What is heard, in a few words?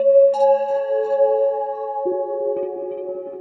Orgriff, Pads; Sonic Foundry (Sony Creative Software); Synchro Funk